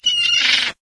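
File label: rural seagull; random amb sfx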